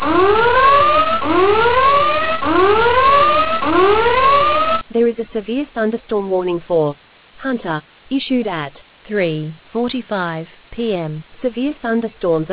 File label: storm audio